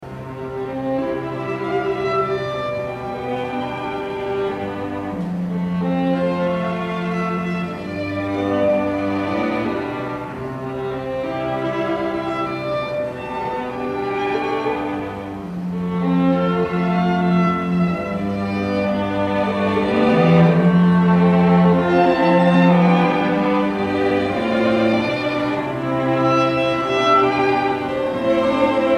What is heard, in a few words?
for string quartet